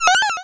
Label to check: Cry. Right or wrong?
Right